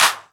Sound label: Clap; Vengeance Samples